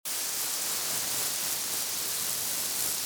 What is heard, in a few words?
clean